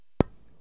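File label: stroke, 9ball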